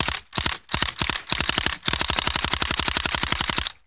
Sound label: Machine Gun Bursts; Weapons & Explosions